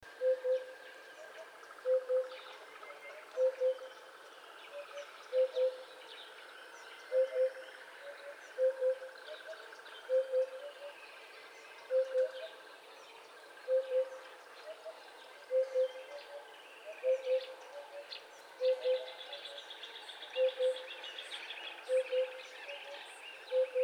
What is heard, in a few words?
African Hoopoe Upupa africana